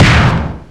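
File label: Rivet Hit Exterior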